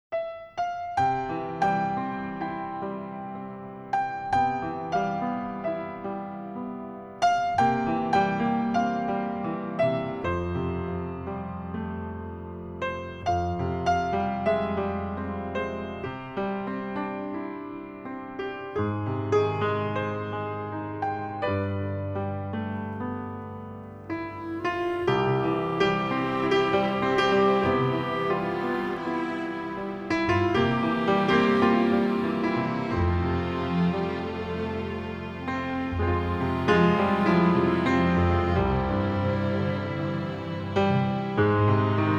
Jingle Bells

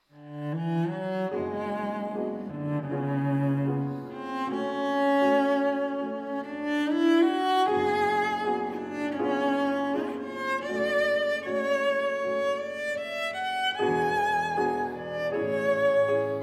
Prelude for Cello and Piano; 24 Preludes; Op. 178

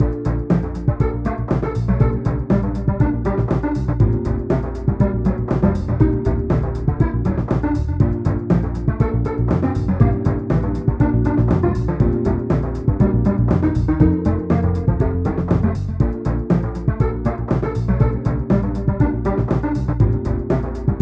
Music Lofi

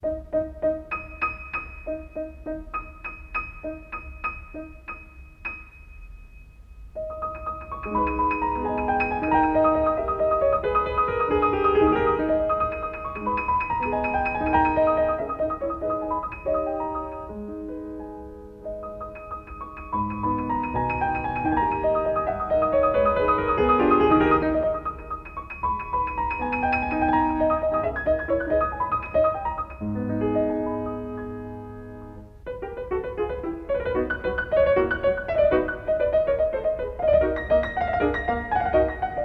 Paganini Etude No. 3; Six Paganini Etudes in G-Sharp Minor, S. 141

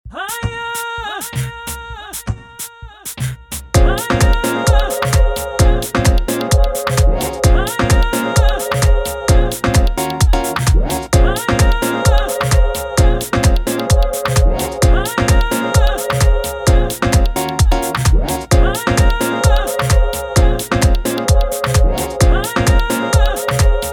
Electro Lights

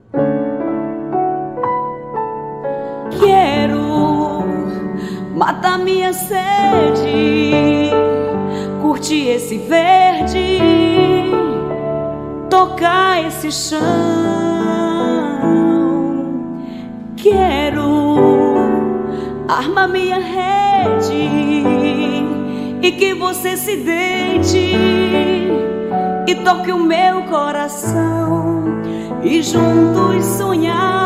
Orchestra, Claire De Lune

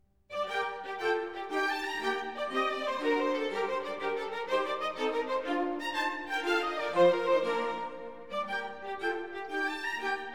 Orchestral Suite No.2 in B Minor

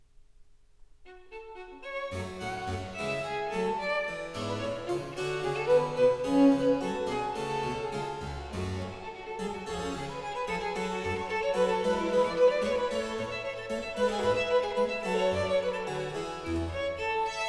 Fugue in C Major; Richard Egarr; Bach, JS; Klavierb chlein f r Wilhelm Friedemann Bach No. 31

